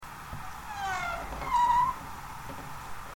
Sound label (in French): volet qui grince